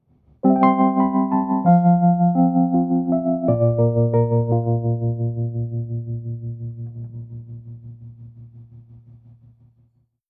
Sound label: keyb